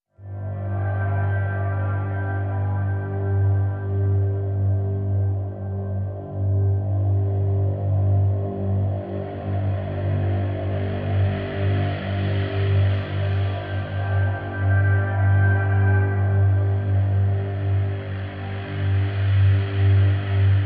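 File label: Drn G, drone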